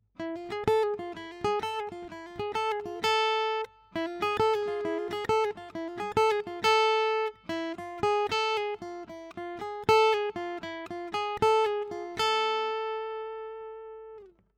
Harmonic Minor Lick, guitar

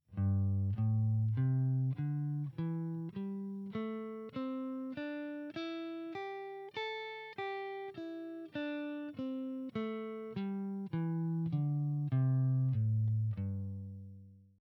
Pentatonic Pattern, guitar

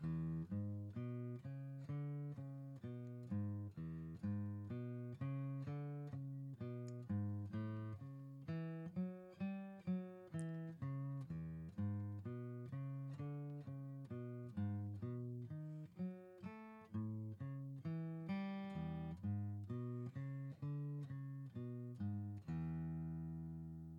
Blues bass line, guitar

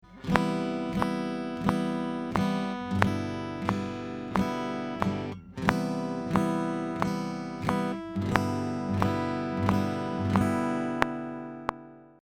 Strum on each beat, guitar

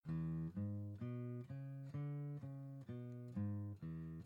Blues bass line, guitar

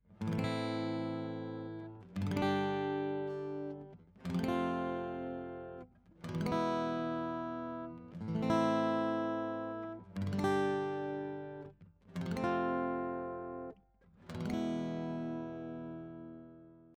Am F G and E, guitar

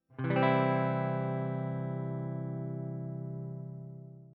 C Chord, guitar

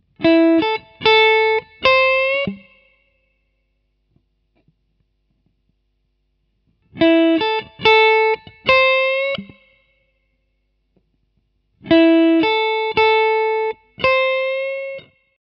Lick

